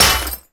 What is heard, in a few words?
Bolts; Peace, Death; Fatecard